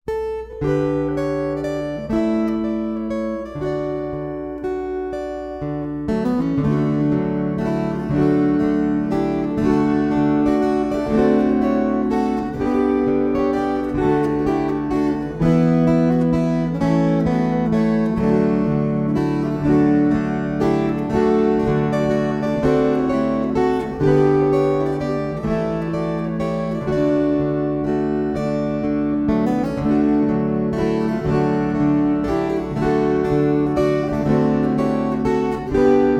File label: Waltzes